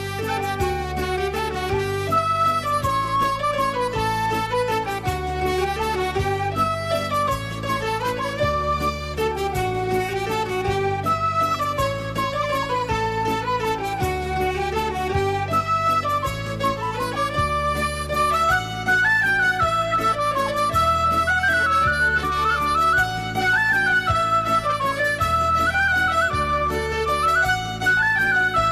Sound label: Mazurka; MAZURKAS; Sally Sloane s Mazurka Luskies